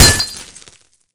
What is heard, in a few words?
bottle broken hit world, weapons; tf